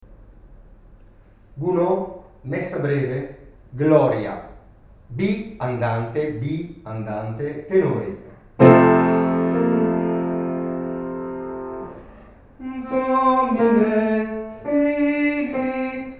Gloria andante Tenori; Messa Breve Gounod

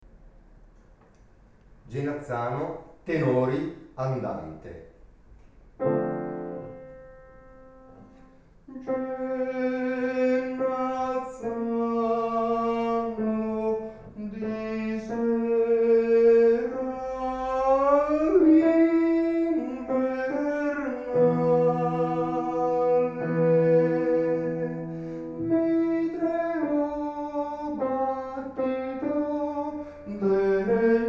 Tenori; Genazzano Bon

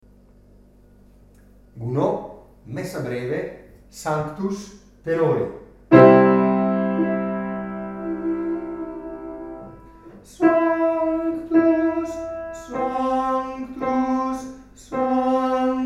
Sanctus Tenori; Messa Breve Gounod

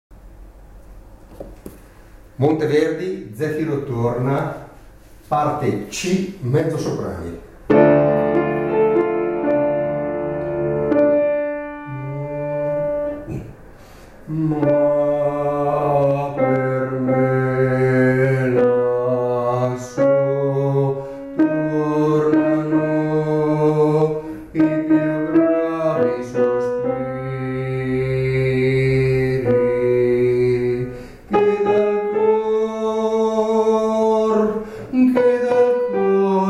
ZEFIRO TORNA mezzi sop

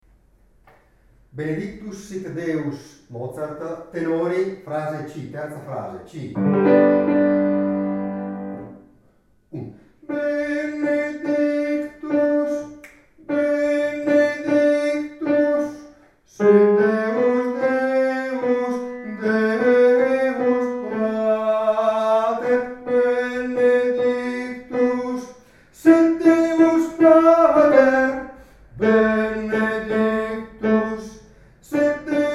Tenori; Benedictus Mozart

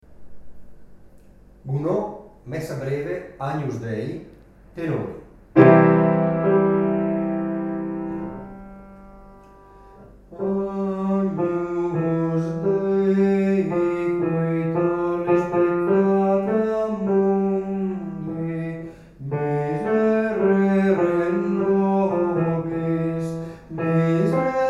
Agnus Dei Tenori; Messa Breve Gounod

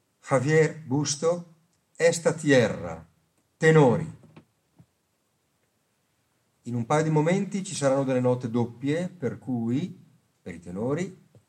tenori; Esta tierra

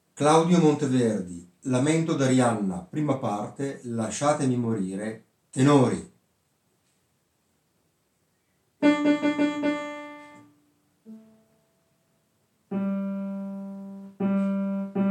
tenori; Lasciatemi morire

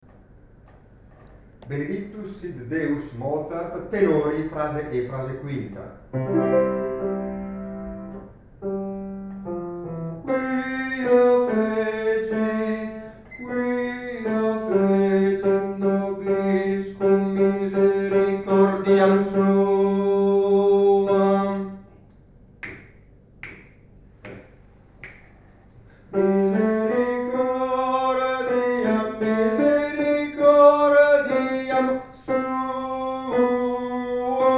Benedictus Mozart Tenori

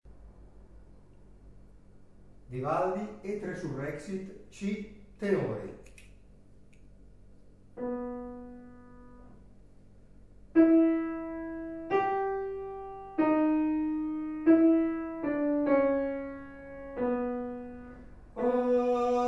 tenori; Credo Vivaldi; Resurrexit

